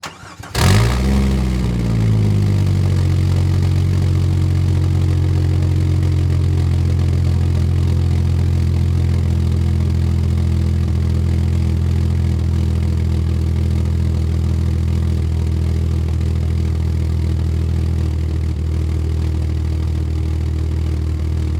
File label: SUV Start Idle Off; Blastwave FX